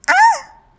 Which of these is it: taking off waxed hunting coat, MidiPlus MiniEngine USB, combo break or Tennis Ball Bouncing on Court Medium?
combo break